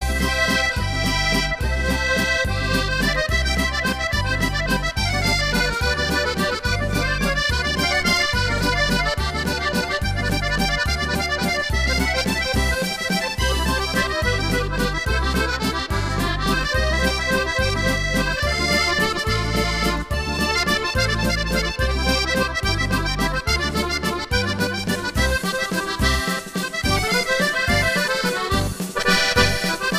valse musette

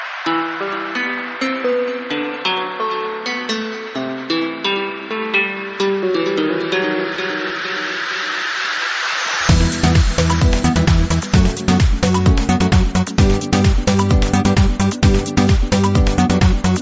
Dj Remix Guitare

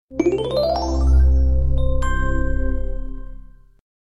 Clochet

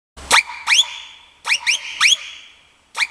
Sifflet turk

